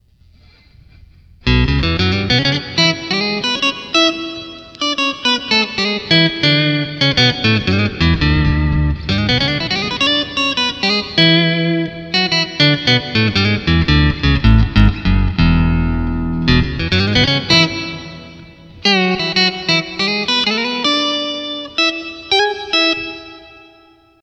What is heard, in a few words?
Octave, Boss GT-8